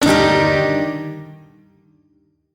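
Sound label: Shawzin Minor Chord; Warframe; Duviri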